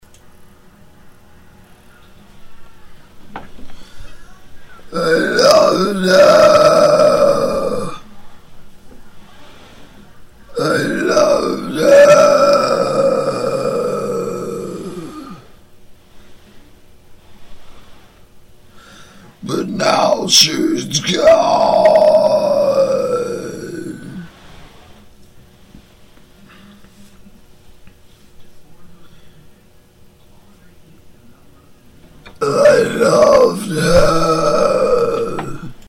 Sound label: mic in track Death Metal Warmup Exercises